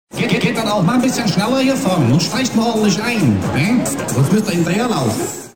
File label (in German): Jingels